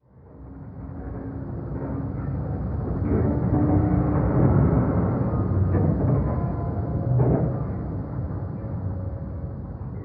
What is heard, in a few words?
ramproom, ambient; nuke